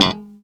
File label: gb3 staccato, glowly bass